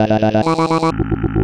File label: Beat